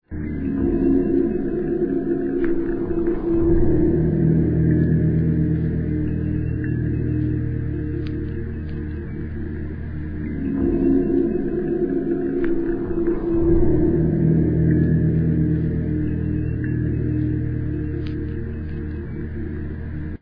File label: grouse low